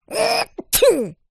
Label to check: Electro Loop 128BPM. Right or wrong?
Wrong